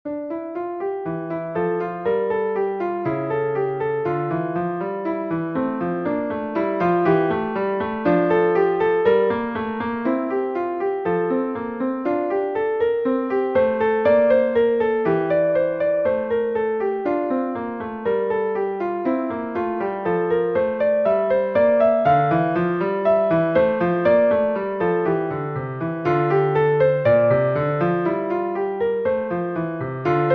Piano Solo

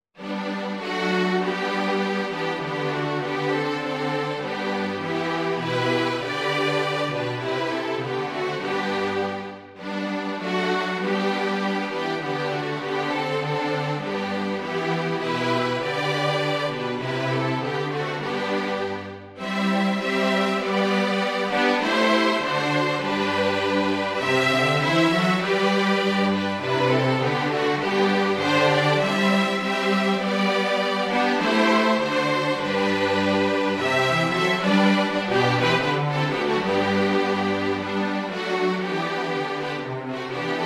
Royal David string; once in royal davids city

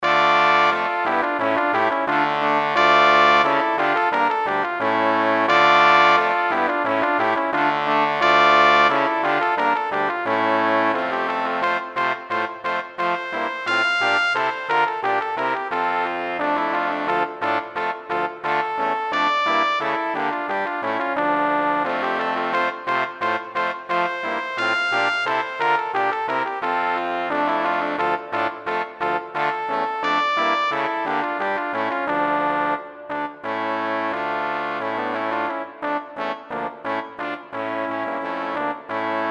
Brass

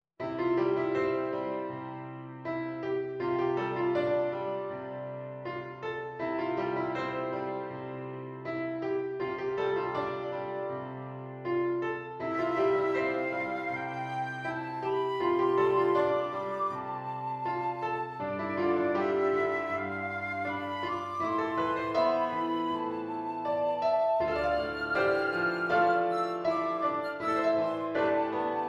flute or oboe

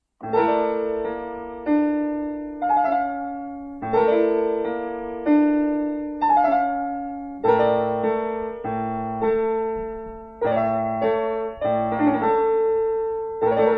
Prelude for Piano; Mehdi Arian mehr

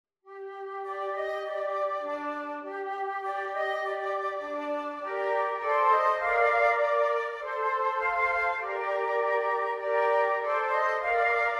flute trio

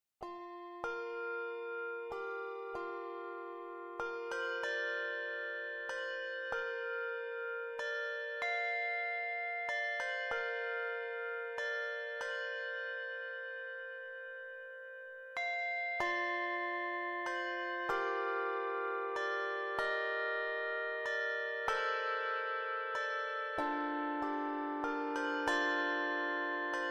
2 octave handbells piano accompaniment

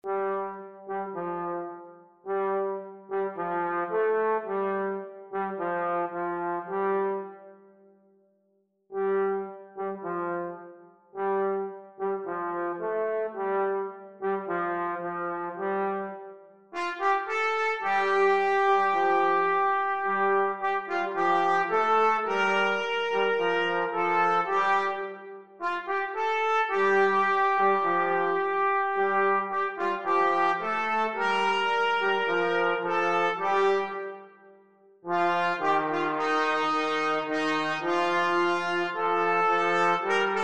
trumpet trombone duet

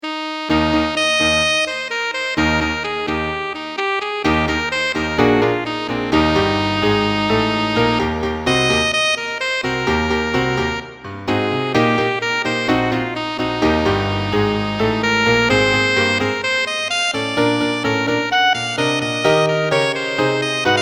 rock Alto Sax and Piano; saxophone

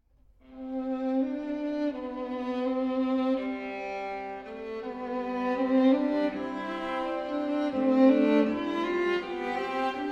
string quartet

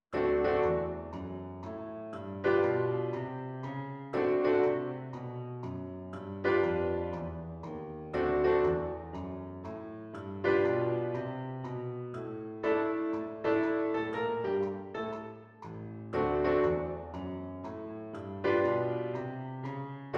Two Violins and Piano

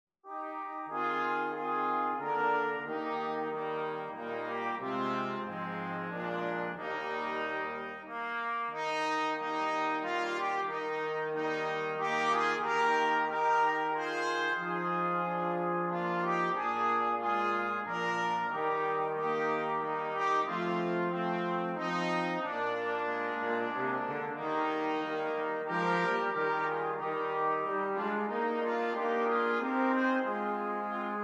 Brass Quartet; Away in a Manger

